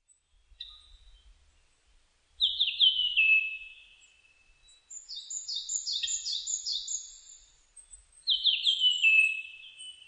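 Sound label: ikaru, Japanese grosbeak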